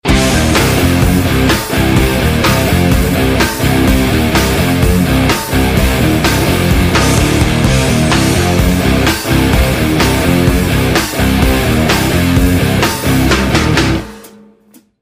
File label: metal ringtone; rock